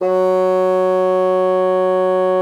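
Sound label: bassoon forte F#3; Xsample VOL-6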